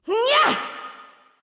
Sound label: grunt attack; smite; hel; ghost